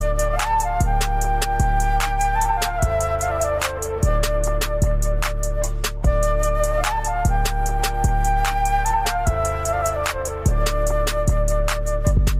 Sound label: flute cover